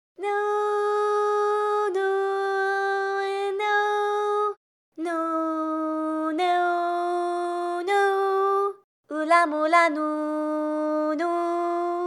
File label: sing